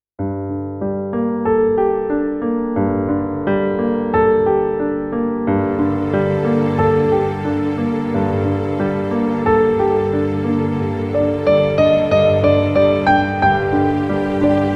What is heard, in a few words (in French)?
Melodie piano